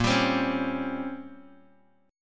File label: Bb Minor 6th Add 9th, Guitar, Open G